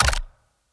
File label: inspect; cstrike; valorant; araxys operator